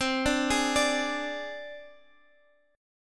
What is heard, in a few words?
C Suspended 2nd Sharp 5th C Guitar Drop D; down; chord shape